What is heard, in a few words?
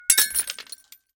Glass Break Hit; Mechanical Wave